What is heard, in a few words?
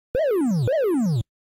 missile